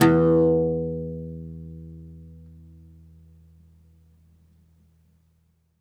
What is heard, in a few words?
OUD E1, Plucked Strings; E-MU Producer Series Vol. 7 – Old World Instruments